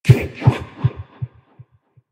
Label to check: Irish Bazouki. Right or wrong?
Wrong